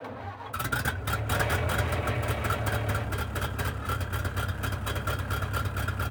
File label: start engine, engines; World of Tanks